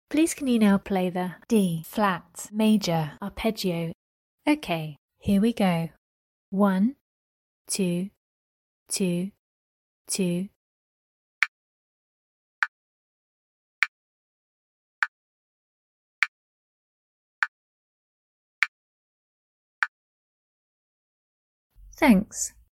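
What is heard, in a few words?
d flat major arp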